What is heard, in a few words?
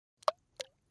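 Splash Sml Pebble Plop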